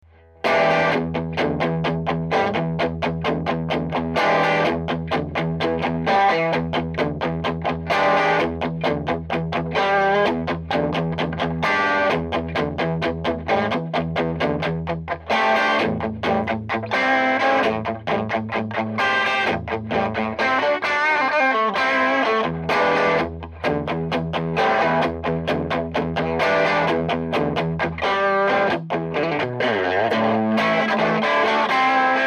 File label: strato OD